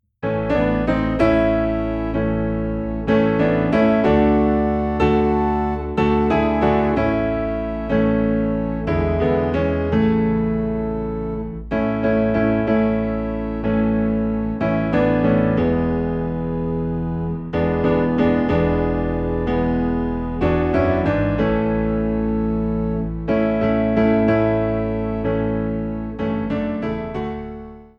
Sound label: Piano and Organ Low in A; Blessed Redeemer